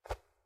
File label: cloth pull; soundscrate